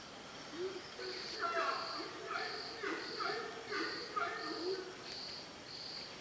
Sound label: Horned screamer, پرنده